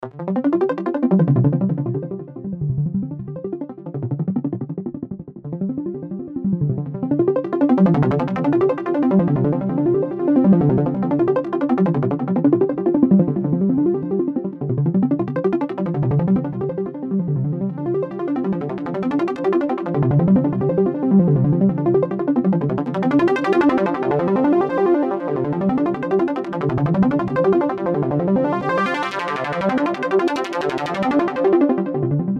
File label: Arp 2600 Sequence Automated; Korg